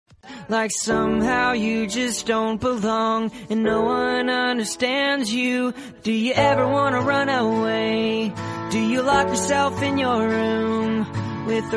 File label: english love song ringtone